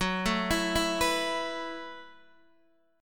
F sharp Minor 11th, Guitar, DADGAD; F#m11 arpeggiated; x,9,7,9,7,9

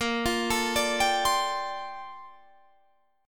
A sharp Major 13th, Guitar Standard; A#M13; arpeggiated; JGuitar

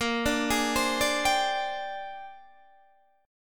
A sharp 13th, Guitar DADGAD

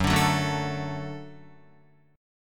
Gb Minor Sharp 5th Gb Guitar; strummed; JGuitar

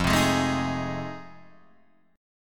F 7th Sharp 9th, Guitar, Open D

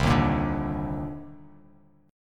C Minor 9th, Bass Standard; strummed; JGuitar

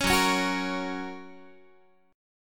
F sharp Major F sharp Guitar; strummed; JGuitar

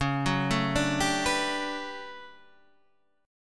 D Major 13th C sharp, chord

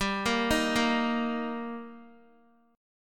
G Minor Sharp 5th G down Guitar; arpeggiated; 4F,4A,5C,5G,5C,6E; JGuitar

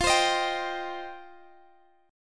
F 7th Suspended 2nd, Guitar Standard; F7sus2; strummed; x,x,15,17,16,15; down; capo=3

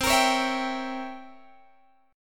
C 7th Flat 9th C Guitar Open G

